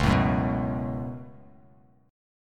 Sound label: C sharp 7th Suspended 4th Sharp 5th, Bass Standard; C#7sus4#5; strummed; JGuitar